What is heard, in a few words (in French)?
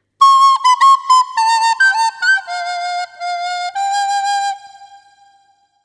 impro flute a bec; pour les petits; loto sonore